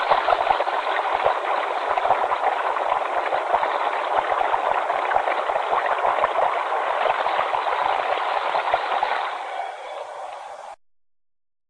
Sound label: frying